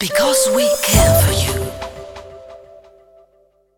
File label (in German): Audiologo; Johnson